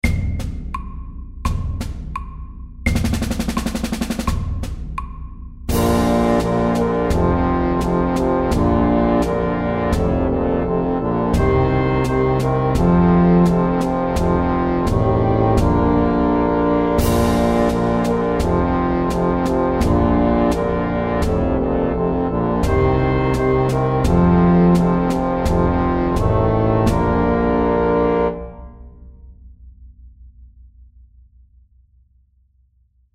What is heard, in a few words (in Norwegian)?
Elefantmarsj MIDDELS